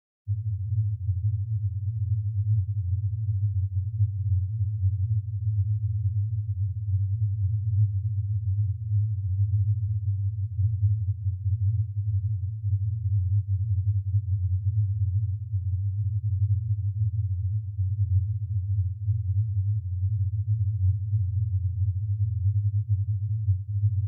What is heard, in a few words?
Subwoofer phase 100Hz; Subwoofer Evaluation and Setup; Surround Spectacular